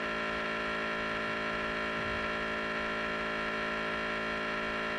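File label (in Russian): громкого жужжания с глубоким тоном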